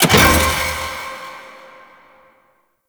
Puzzle Switch Success; Enemies; Warframe; Kela De Thaym

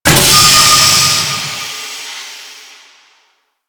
Steam Heavy Burst; Warframe; Grineer Shipyards

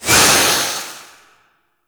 Incarnon Void Release Short; Weapons; Warframe; Duviri; Incarnon Adapters

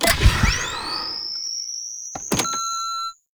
revive start; Quests; Veilbreaker; Kahl Friendly Grineer

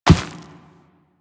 Run Dirt, Footsteps; Warframe; Operator

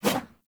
Sword Whoosh

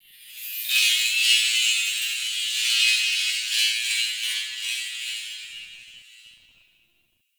Energy Chatter; Props; Warframe; Ambience; Sentient Devourer

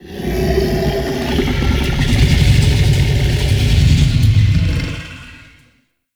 Pit Monster Inhale, Infested; Sleep; Warframe

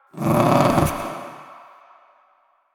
Ambient Meow; Warframe; Kalymos

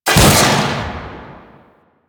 Shackle Cast; Warframe; The New War; Deacons

